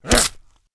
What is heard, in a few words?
horseman attack; propack